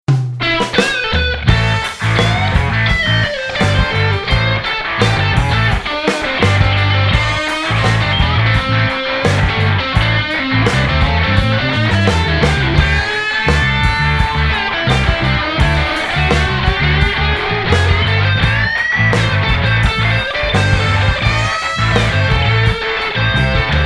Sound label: Classic Rock